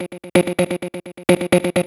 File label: House Loops, Vocal Loops House 128BPM